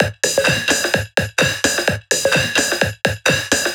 perc 128, Electro percussion loops; Anthemic

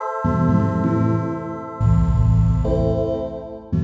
DEEPHOUSE ORGAN 125 A

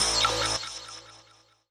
SPACE SYNTH 140 A; SpaceSounds

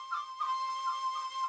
FLUTE ETHNO 160 C

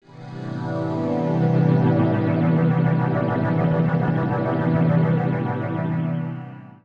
SPACE PAD 140 A; SpaceSounds

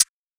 hat; Anthemic

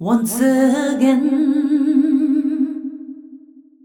deep house female, 125, A, vocals

